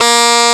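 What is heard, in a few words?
baritone sax, FAIRLIGHT CMI IIX REEDS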